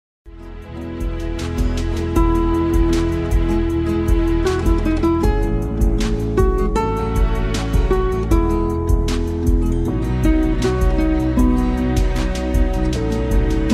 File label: Romance in F 783 hz